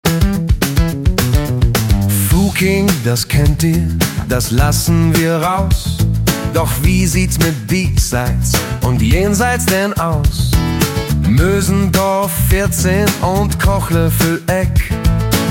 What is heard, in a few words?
Song; Schraege Orte OOe; schraege orte in ooe